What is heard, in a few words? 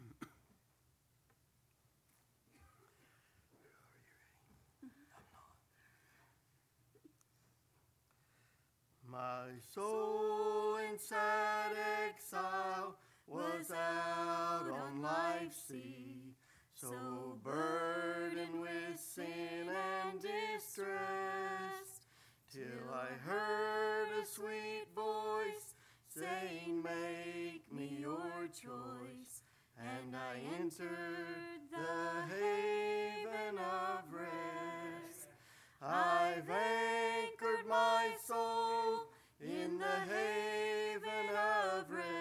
singing Family